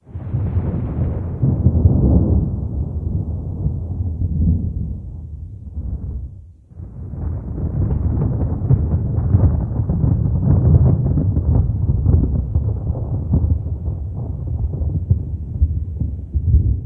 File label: citadel outside fx; source engine; episodic